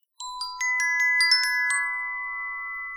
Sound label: denoising glock 20dB